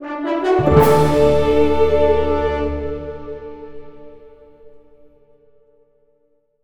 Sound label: reward big; Armello The Bandit Clan